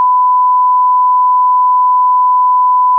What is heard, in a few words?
pure tone